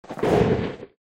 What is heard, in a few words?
crack